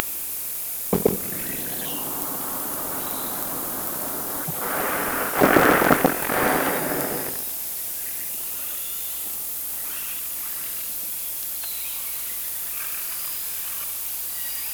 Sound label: Orca sound Night Passby